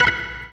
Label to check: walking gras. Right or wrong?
Wrong